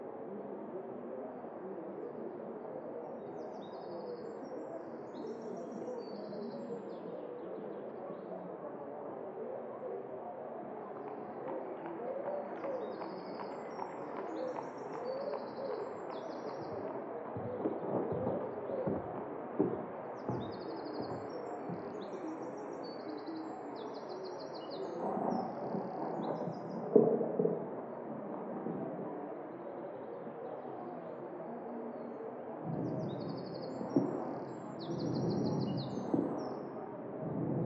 indoors, ambience; pf2e; hellbreakers

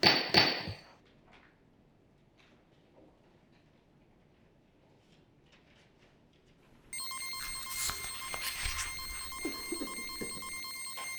machine court; sf2e; murder in metal city

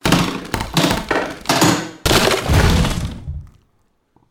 break barricade

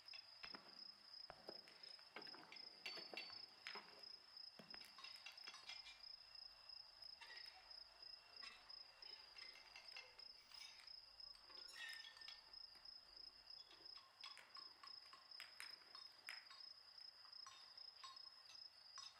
nighttime, ambience; egorian; pf2e; hells destiny

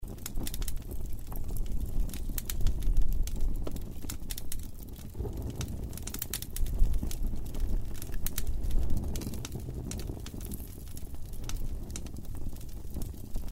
Torch; fa battlemaps

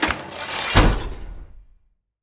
open heavy, doors, jail

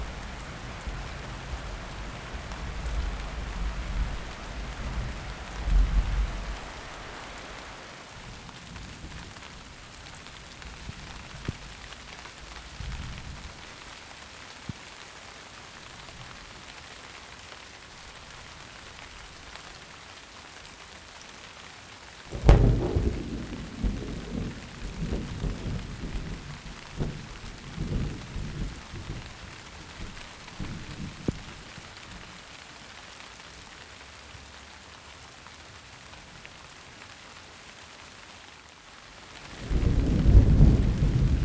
thunderstorm; calendaria

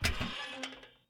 open creaky, doors, jail

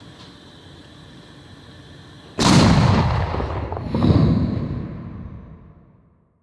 Exploding Stove; pf2e; the seventh arch

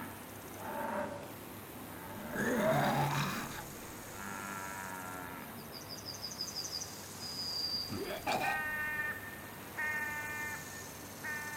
countryside, ambience; geb

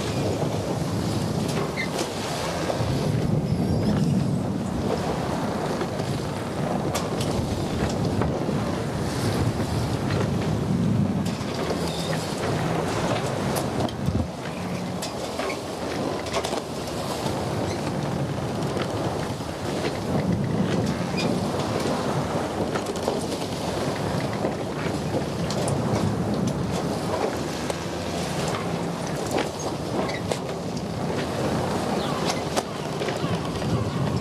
ship, ambience; pf2e; hellbreakers

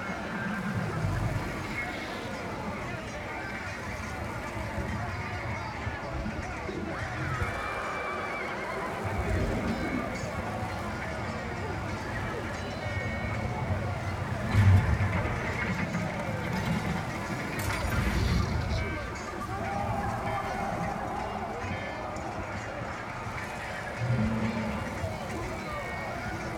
battle, ambience; pf2e ap223 hells destiny; whisperwood

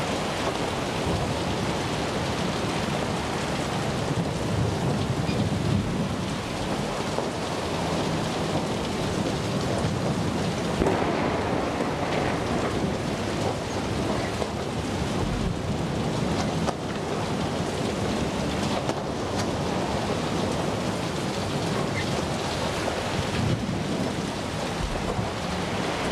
sea storm, ambience; pf2e; hells destiny

